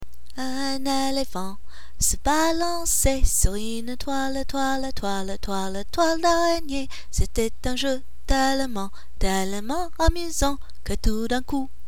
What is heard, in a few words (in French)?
un elephant